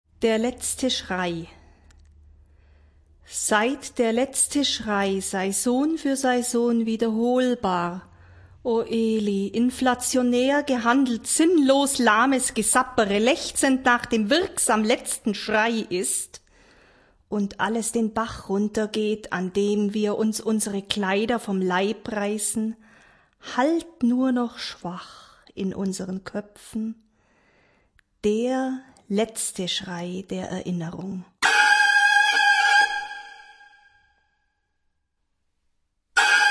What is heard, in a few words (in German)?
schrei